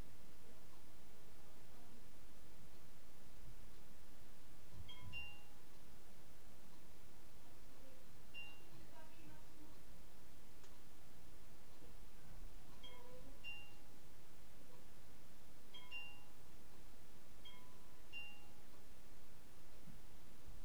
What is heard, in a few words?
doorbell test